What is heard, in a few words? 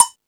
Percussion, Drum Machines